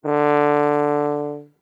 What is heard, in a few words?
Tuba ff D3; Brass